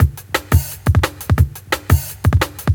DNB BREAK

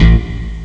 rythm guitar; ST-XX Amiga Soundtracker Sample Packs

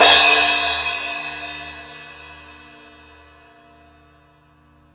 Fone Hat Crash; KJ Sawka; Drum n Bass & Beyond; Drum Tool Box

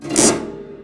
Prepared Piano; Battery 3 Sample Kits

